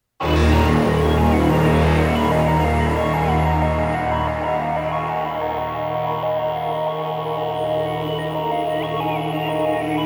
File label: Theme